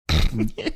snorting laughing

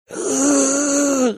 making funny sound

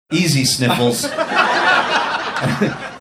easy sniffles

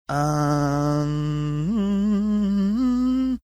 melodic ummmmmmmm

